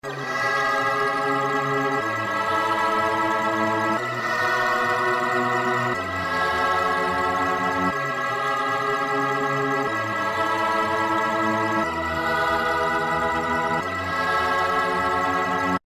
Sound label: synth; akai x 7000